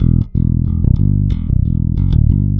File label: BASS X